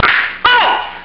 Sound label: SLAP